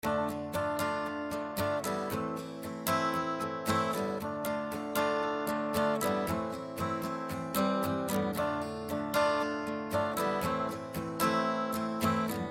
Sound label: Alternative guitar